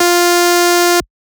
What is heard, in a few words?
Lead - F